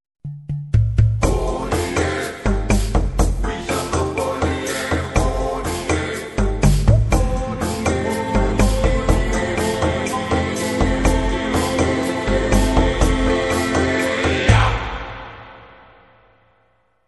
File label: African Tribal Dance, new age music, atmospheric music, relaxing music; Album: Atmospheric Music